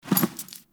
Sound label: Foley Idle Short; Ambience; Gameplay; Teshin; Warframe; Duviri